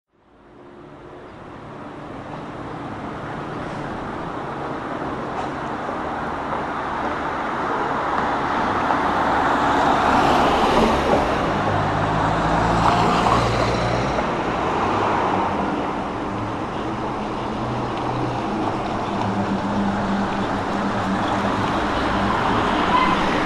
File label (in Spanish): calles; valparaiso y rioja